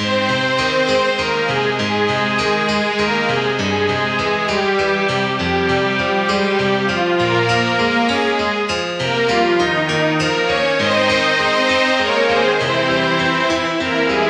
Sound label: CLASSICA